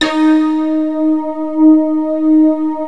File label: NEON D#5; Northstar - New Gold VOL-1; M1+D50; NEON AMS